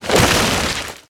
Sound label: objects, World of Tanks